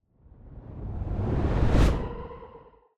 Whoosh, Logo, Airy to Shiver, Uncertain, Low; Eclectic Whooshes; Sound Design

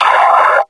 die, combine soldier; hl2

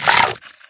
wake, zombie poison; hl2

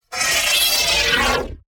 Creature Long Growl, Robotic Creatures; DavidDumais; Robotic Creatures Sound FX

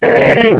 warn, zombie poison; hl2

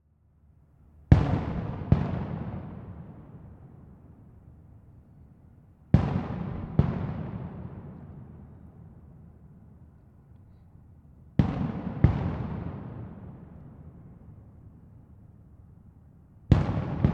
FIREWORKS; Stanley Park; Neumann KMR 81+SD 702; Bombay Sonic

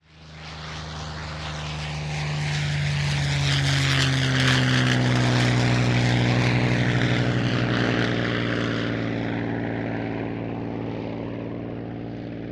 P51 Mustang - TAKE OFF - By; DPA4017; Pole Position; The Aircraft Ambient Library

